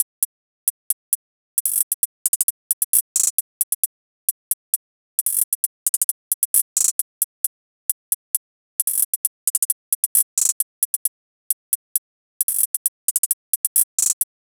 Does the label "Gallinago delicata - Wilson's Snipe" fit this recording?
No